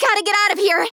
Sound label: Taking Damage; Paladins